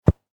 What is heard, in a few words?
Sports Soccer Ball Land On Grass